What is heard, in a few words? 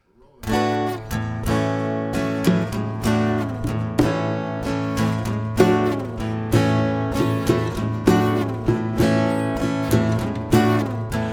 snore